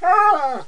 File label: wolf death; minecraft; technic